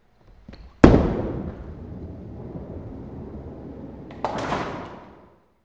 Bowling Ball Rolls

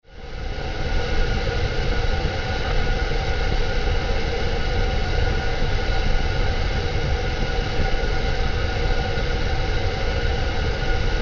Engine Room Ambiance, spaceship fx

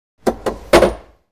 Bowling Ball Hits

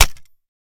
Mouse Trap Snap Hvy

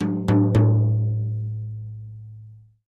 Drums Toms B Herald, drums tom

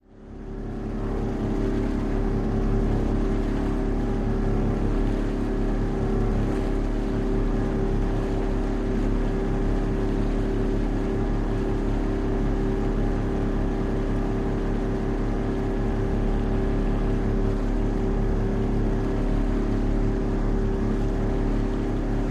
Military PBR OB Water

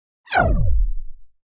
Laser Pulse Flange

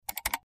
Highpitched Mouse, mouse

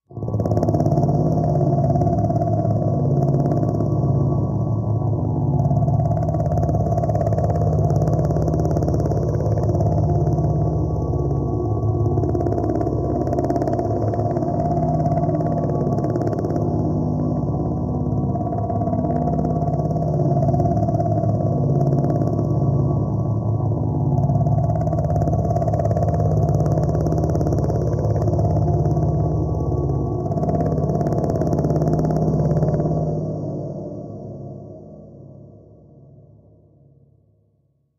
Electro, energy beams

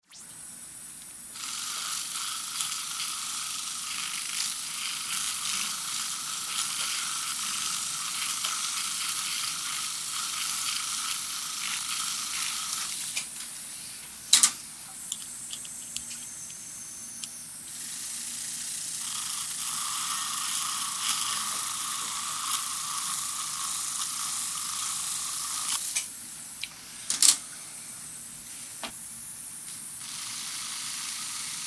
Speed Dentist Drill

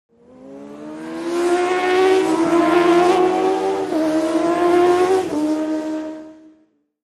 Motorcycle Road Racing

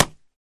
Boxing Glove Hit Slap

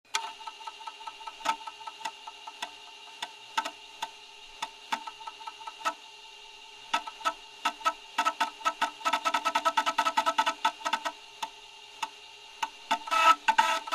Floppy Drive Spinnin, hard drive whir

